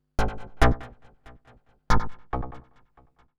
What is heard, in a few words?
TEKNO 140; Techno